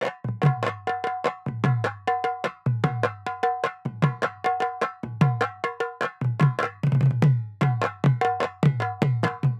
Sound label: Negera Loop BPM 100, Assamese folk; Gully Loops; waves of bihu